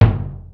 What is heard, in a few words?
XD-5, DRUMS PERC, BOSS